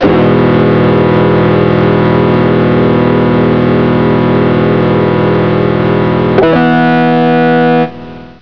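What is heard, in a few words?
WHITE NOISE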